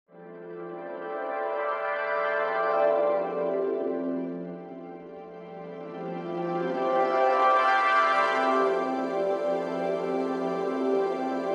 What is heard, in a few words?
ambient